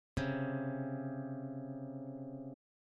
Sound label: intervals harmonic minor second, guitar